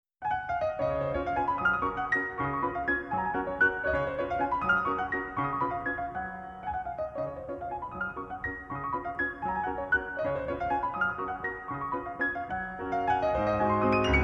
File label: WALTZ IN G-FLAT MAJOR, OP. 70, NO. 1; Chopin